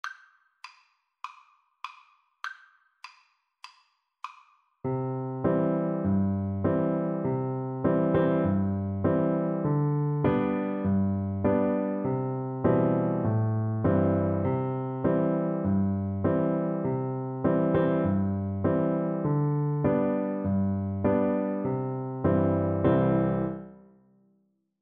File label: london bridge, Piano Duet